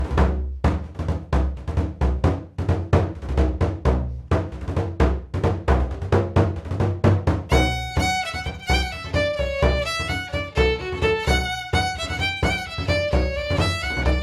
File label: Orchestra Ambience; Assassin's Creed III